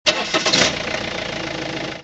car; TamTamMicro.activity

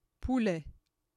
chicken; FoodChain.activity; fr

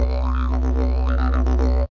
didjeridu; TamTamMicro

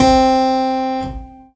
harpsichord; TamTamMicro

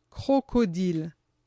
crocodile; FoodChain; fr

